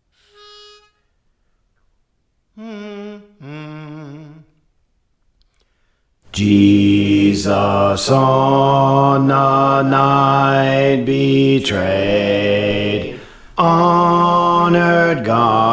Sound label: Bass; Praise For The Lord Training